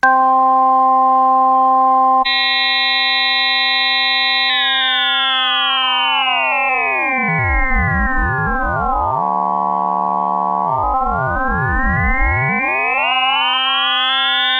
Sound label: Hammond SK1 Ringmodulator off fade in turn knob down up